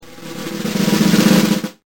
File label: drumroll